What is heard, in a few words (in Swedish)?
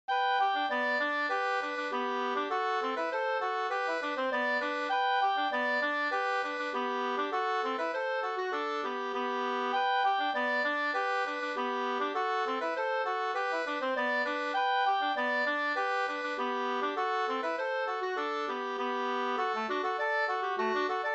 snoa